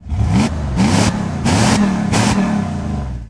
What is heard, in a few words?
Truck Dakota Rev; Trucks